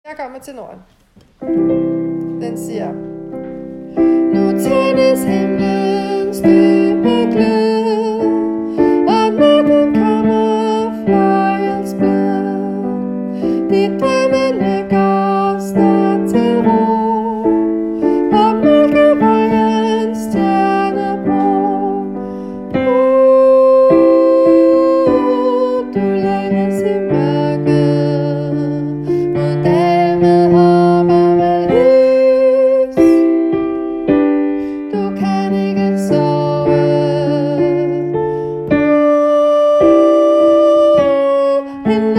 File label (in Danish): tenor bas